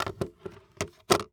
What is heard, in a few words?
Pistol Set Down On Empty Table, weapons; AdobeCC